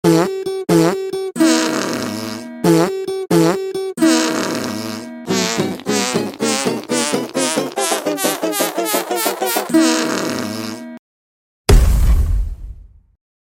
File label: musical farts